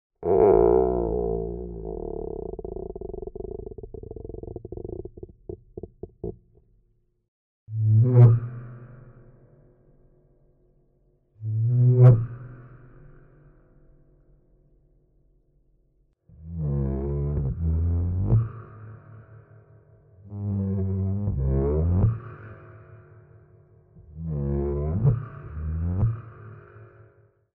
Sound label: Rubber Band Box